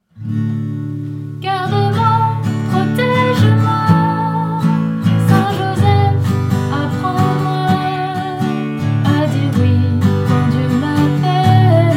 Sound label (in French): Chant; St Joseph